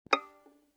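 Violin pizzicato fortissimo on A string Eb6 stereo; MIS Pitches - 2014; Strings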